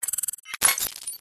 nanotech complex short reversed robotic robot cyber tech nano micro reversed; PMSFX